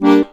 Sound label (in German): HOHNER, AKKORDEON